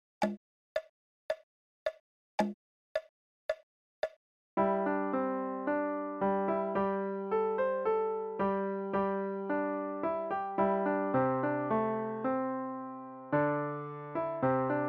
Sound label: Chinese Folk Song